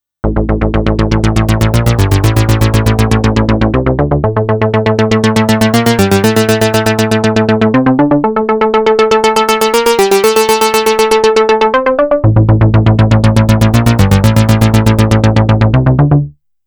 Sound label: synth